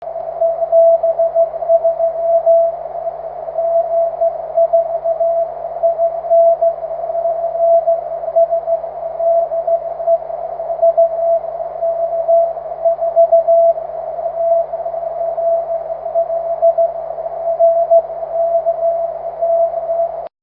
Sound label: cw; 23cm